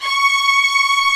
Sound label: ARCO STRGS M; OMI - Universe of Sounds